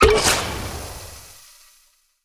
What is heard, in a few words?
Potion Lid Pop